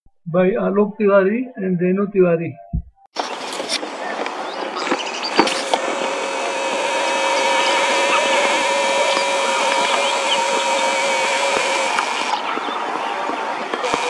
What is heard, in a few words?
Blue Rock Thrush; Alok